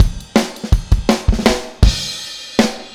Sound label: Take Your Toms Off - Fill - Full Mix, Drums; Sonic Foundry (Sony Creative Software); Drums from the Big Room The Mixes; Demo Projects